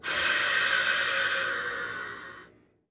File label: steam; Toy Story Activity Center (Disney-Pixar)